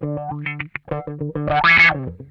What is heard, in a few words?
OUCH, Funk Guitar; Zero G - Funk Guitar